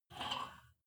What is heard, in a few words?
weight rack pull out